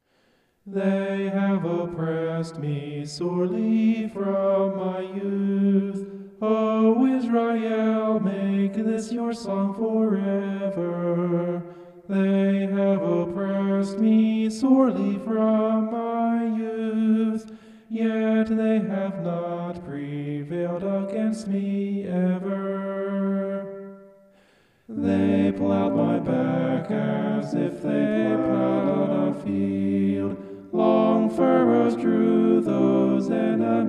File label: vocal homoph; psalm 129